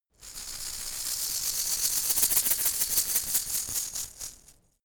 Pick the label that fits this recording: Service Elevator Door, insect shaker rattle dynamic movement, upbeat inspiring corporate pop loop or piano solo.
insect shaker rattle dynamic movement